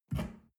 shell grab from tablecloth soft; soundpool

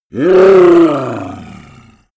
yeti growl angry command; kreaturen

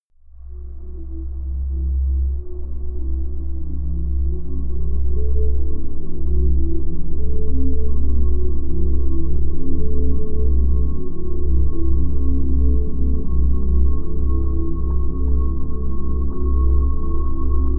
drone marsh mire

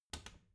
bathroom cabinet door creak close soft

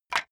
wood board small drop; objekte; holz

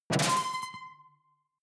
sci-fi alien console high; maschinen; signale; soundpool

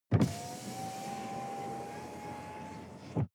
sports car int window open; verkehr transport; autos

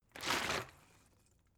bottle water sparse upend soft; berufe

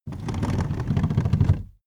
car seat slide forward slow rattle

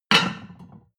plate on plate place hard, restaurant cafe

